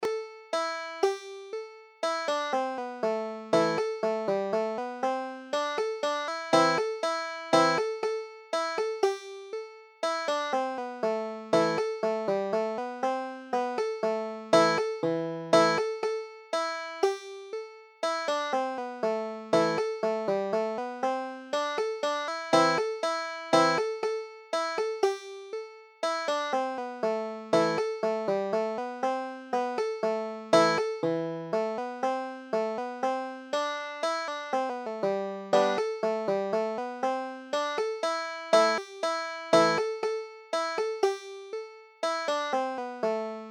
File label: banjo